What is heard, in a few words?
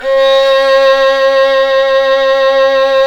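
solo violin, Synclavier Strings; ILIO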